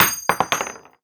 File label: Shell heavy wood; STALKER; IWP